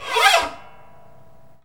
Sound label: WIND SOUN